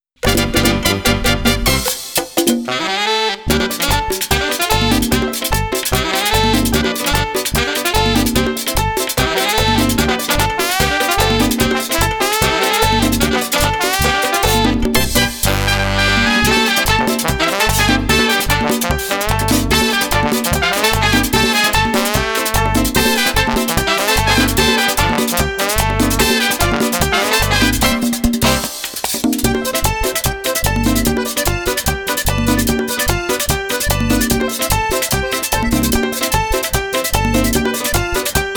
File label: Merengue Loco (Instrumental); Total Overdose